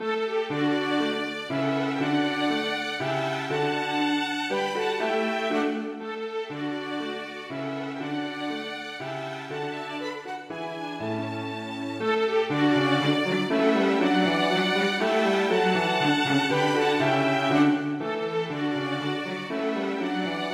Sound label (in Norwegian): Menuet for to fioliner, cello og piano; Alternativt tempo